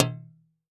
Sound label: Impact Street Sign Metal Hit By Plastic Tube